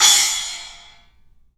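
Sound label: CLUB CYMBALS; 3D Sound; 3D Drums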